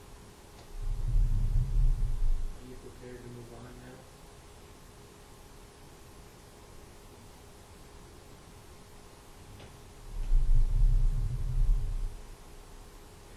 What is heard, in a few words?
with both breath sounds